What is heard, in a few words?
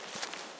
Grass footstep